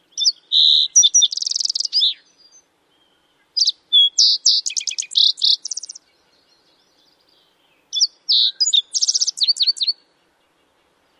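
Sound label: songs thick-billed subspecies, Fox Sparrow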